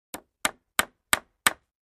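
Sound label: 2x4 Hammer Nail On Cement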